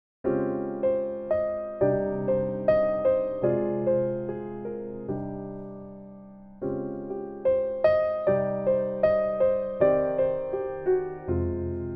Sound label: phrygian; godfather